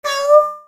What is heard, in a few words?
hurt